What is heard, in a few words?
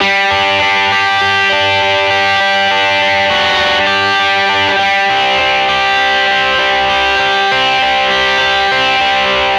100 electric guitar loop rock high Gmaj